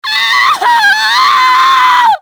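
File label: scream, female; fmale; Soldier Of Fortune II Double Helix; XBOX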